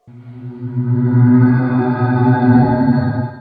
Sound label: skinwalker alert; skinwalker fleshgait; slender skinwalker